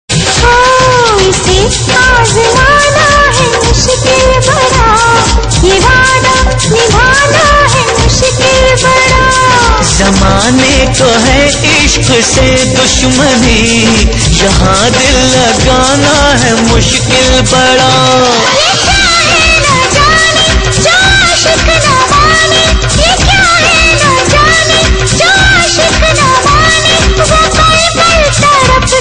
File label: Indian Ringtone